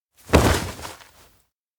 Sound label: grab collar; chiharu; anemoi